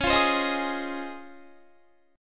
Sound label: Db Major 9th, Guitar; strummed; JGuitar